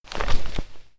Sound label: page turn